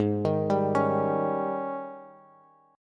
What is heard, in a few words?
Ab Major 7th Suspended 4th Ab down Bass; arpeggiated; JGuitar